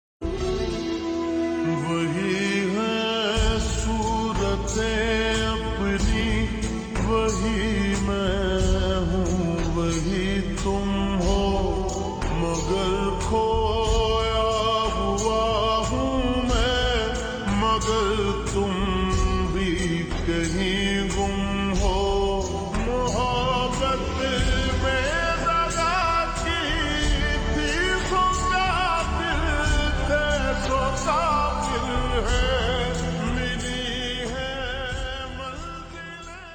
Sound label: Ringtone slowed reverb